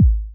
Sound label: bassdrum synth, drum samples